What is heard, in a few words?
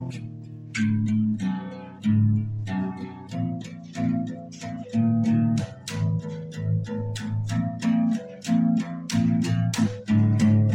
guitar